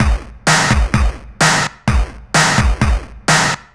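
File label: Bass drum snare, 128bpm, Nu Dance Electro; Zero-G; Soundclash; Acidized Wavs; Combi Loops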